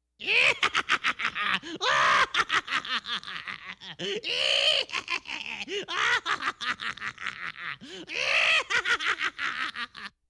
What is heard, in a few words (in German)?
hexengelächter; Geraeusche; Lachen